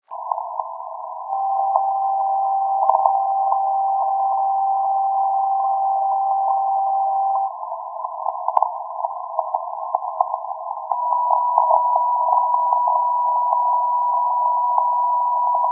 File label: EFA Tonfolge slow, Natural Radio